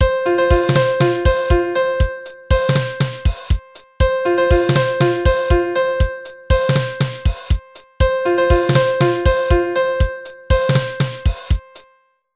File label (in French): carillon